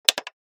CD player button press pause

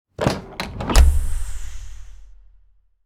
Sci-fi hydraulic door opening or closing

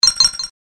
System confirm beep

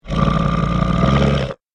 Bear growling and snarling; animal

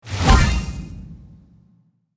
Money notification chime purchase sale

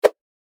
Quick swipe transition; shorts sound effects

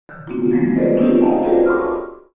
Old vintage cartoon dizzy marimba

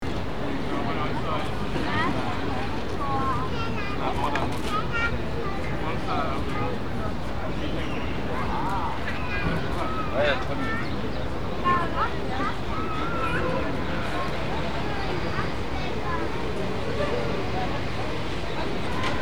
City playground ambience near by traffic loop

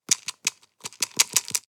Pouring pills into hand plastic pill container; medicine

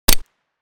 Beretta handgun single gunshot suppressed

